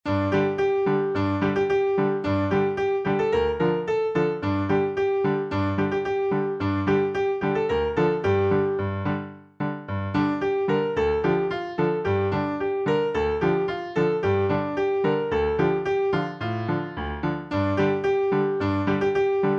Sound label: v la l bon vent instr